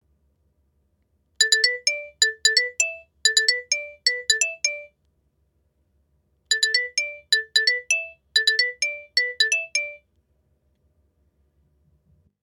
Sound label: Xylophone; olofonia